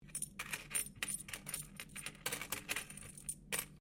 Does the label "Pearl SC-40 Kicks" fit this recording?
No